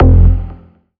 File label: Bass F, Bass Synth